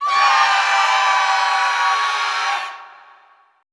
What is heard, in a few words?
SCREAM FEM, Classical Choir; Best Service; Extended Classical Choir; CLUSTER